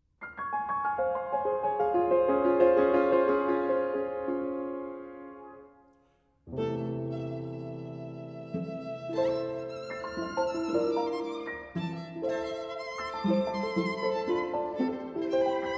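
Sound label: piano quartet; catoire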